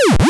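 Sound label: retro shoot, Retro Shoot, Digital, Game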